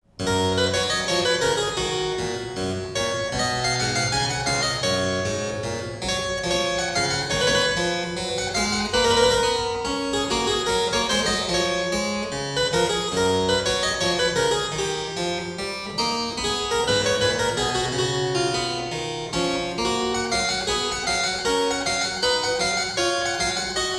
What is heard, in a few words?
g major prelude, Prelude Harpsichord